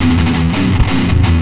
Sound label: Riff, Industrial; Nasty